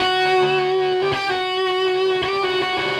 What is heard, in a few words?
Sing Stay, Electric Guitar; Sonic Foundry (Sony Creative Software); Friendly Fire; Serpent